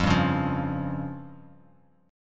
F Suspended 2nd Sharp 5th, Bass Standard; Fsus2#5 strummed; JGuitar

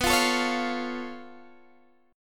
C sharp Augmented 7th B

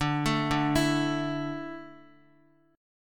D Suspended 2nd D down Guitar, chord; arpeggiated

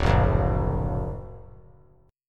D Augmented D down; D+ strummed; JGuitar

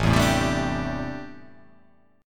A Minor 11th A, Chord

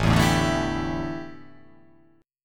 A 7th Suspended 4th, A, down

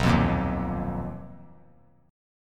B Major 7th Flat 5th B down, chord shape; BM7b5; strummed; JGuitar

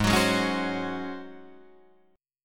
Ab 11th Ab Guitar Drop D; strummed; JGuitar

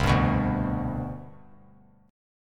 C Major 7th Suspended 2nd C down, chord; CM7sus2 strummed; JGuitar

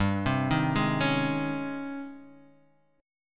G sharp Major 11th G

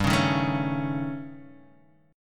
A 7th Flat 5th G

